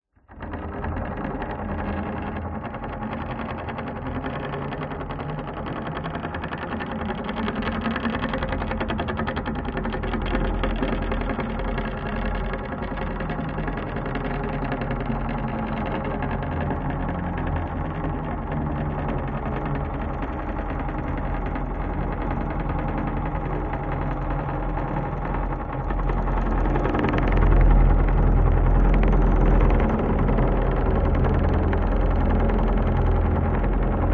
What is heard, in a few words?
industrial machine motorised whir cable lift wooden on operate off; zapsplat